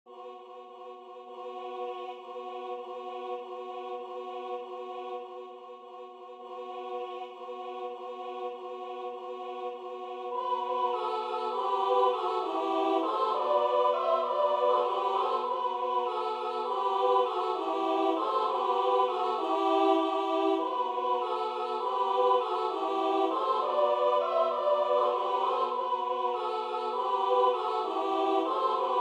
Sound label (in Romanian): colinde, Ardeal; Ia sculati boieri sculati